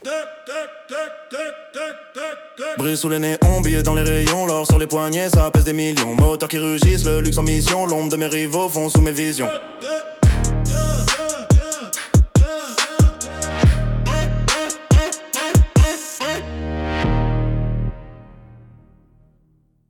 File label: Trap Rap; rimes embrassees